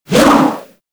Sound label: Hammer Weapon Launch; Warframe; Grineer; Hammer Boss